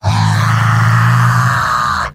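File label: yell, robot; heavy; mvm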